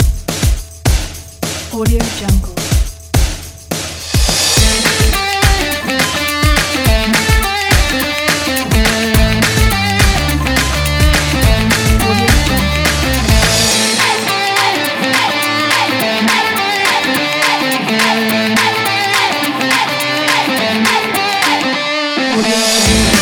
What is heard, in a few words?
upbeat rock energy